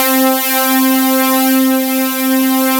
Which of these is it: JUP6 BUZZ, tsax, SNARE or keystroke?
JUP6 BUZZ